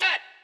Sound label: chant